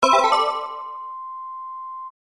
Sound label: start